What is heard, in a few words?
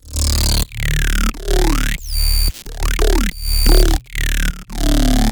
D root 90 BPM; Frogg Bogg